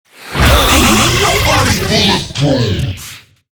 Infested Lich Drillbit Death

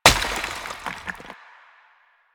Destroy Planter Hanging; Warframe; Ambience; Nineteen Ninety Nine

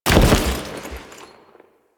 Foley Land; Warframe; Kahl

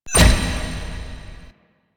Vaults Stairs; Warframe; Entrati

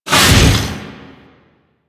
Melee Whoosh; Warframe; DE; Sentients; Erra; Weapons

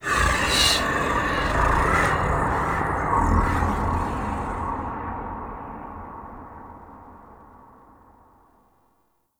Sky Breaths, Ambience; Warframe; Duviri

